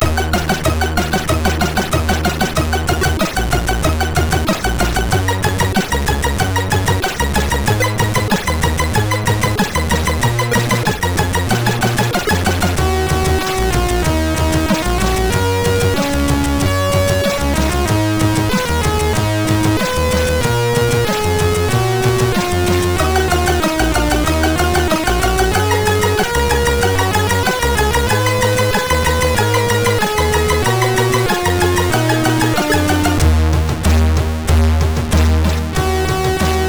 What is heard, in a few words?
Music Box tune, MOS6581R3